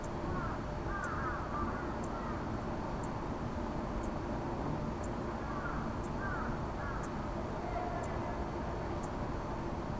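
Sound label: School Office; Tokimeki Idol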